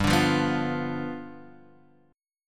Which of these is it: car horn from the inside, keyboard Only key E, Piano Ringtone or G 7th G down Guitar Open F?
G 7th G down Guitar Open F